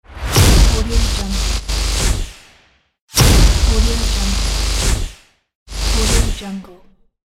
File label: electric logo; wavbrulee